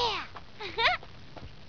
yeah; skuld - starmage

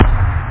gated, bass drums; da capo vol1

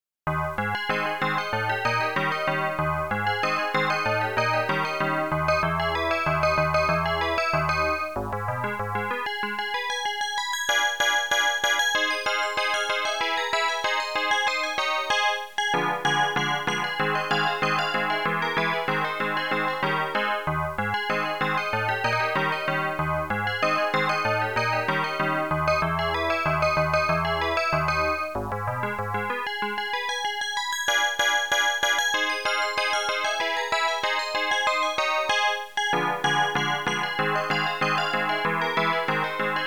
maple rag; Educational Software Cooperative 4

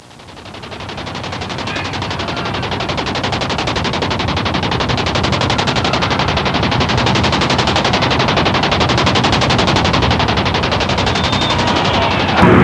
synth; Sound Library 2000; Wayzata Technology; Music In

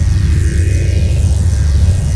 Paralyzator; ImpGal2; spacewar

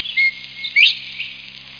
Bobwhite, Birds; Pierian Spring Software

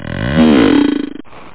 laugh; da capo vol1

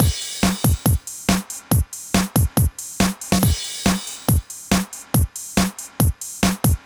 drum; widdagroovebox

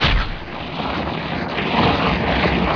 suck; BESTSHOT; noisybit

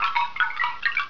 Chim; The Backyard

